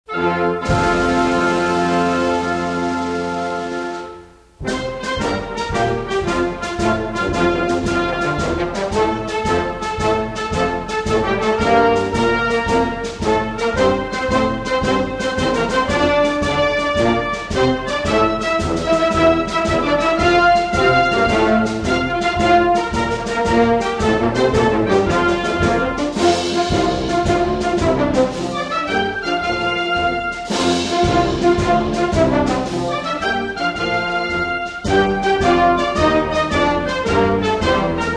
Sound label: Yemen National Anthem